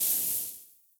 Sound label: Keyboard Down; Business skieme Beta 1